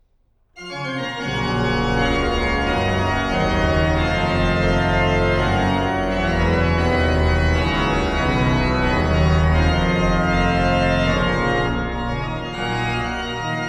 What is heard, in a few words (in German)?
Orgel, Allegro; Drei Tonstücke für Orgel op. 22 - III Allegro